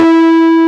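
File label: mild harmonics E2, guitar; AKAI S-Series CD-ROM Sound Library VOL-8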